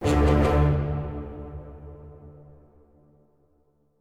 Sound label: village lost night; Armello The Bandit Clan